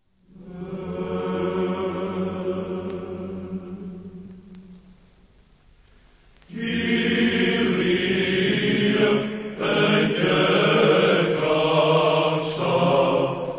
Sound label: kyrie ekekraxa grave tone